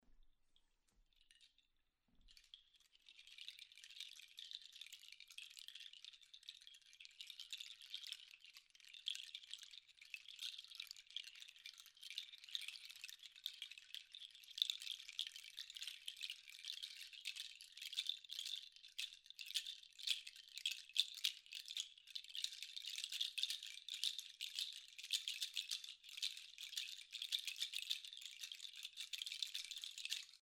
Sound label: seed pods